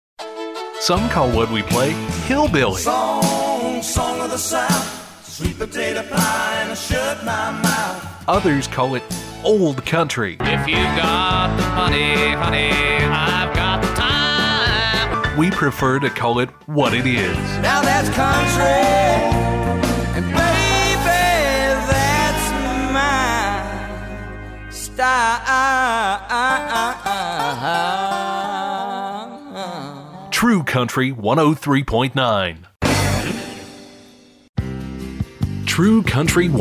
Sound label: Classic Country